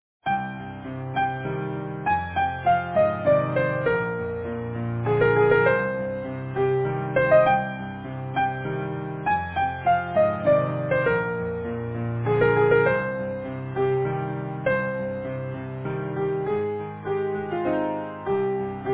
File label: Easy Piano